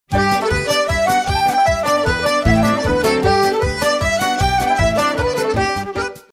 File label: polka; KILLYON SET